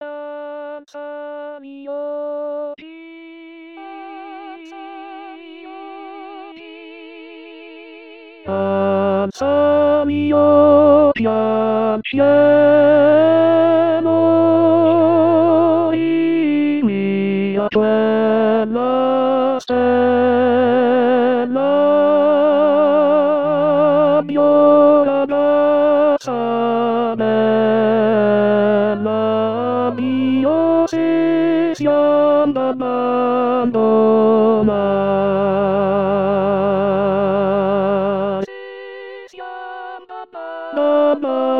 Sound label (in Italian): tenore in rilievo